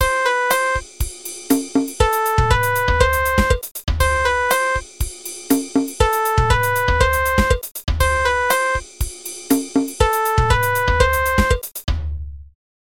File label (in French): carillon